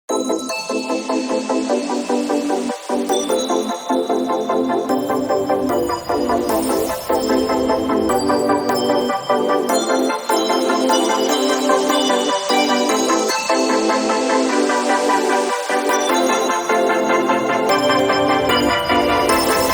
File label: Melodic Dubstep